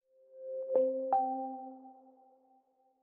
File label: gong